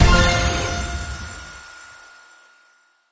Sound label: wheel selected; Hot Spin Deluxe